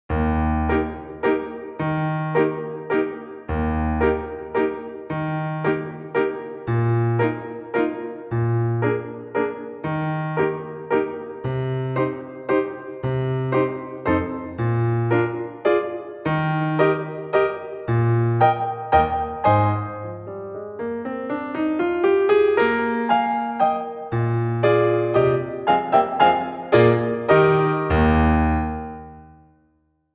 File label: Eb accompanimen; camille saint-saëns; léléphant; from le carnaval des animaux